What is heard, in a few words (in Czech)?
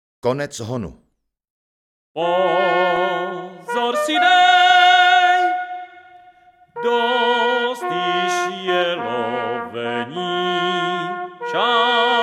Konec honu, troubeni; desatero